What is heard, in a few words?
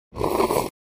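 slurping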